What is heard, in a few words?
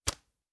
Arrow Shot; Survival Game Sounds - Lite Edition; Combat and Hunting